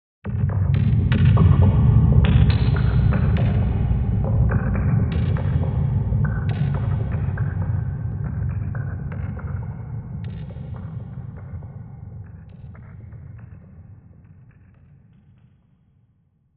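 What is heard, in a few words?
Haunted Textures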